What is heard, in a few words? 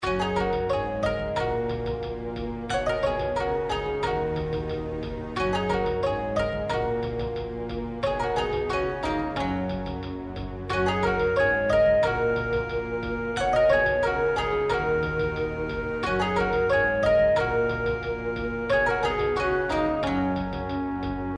intro bgm; Ming Imperial Guards